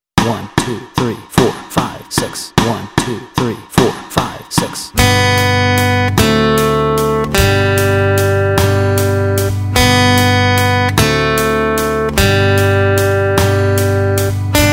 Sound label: Three Blind Mice Melody and Chords Track, guitar